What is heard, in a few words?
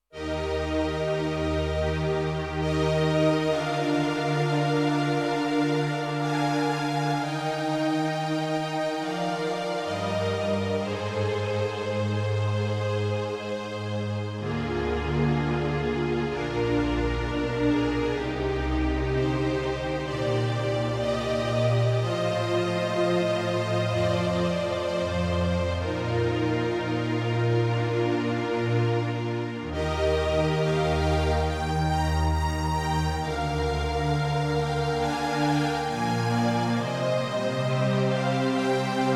Strings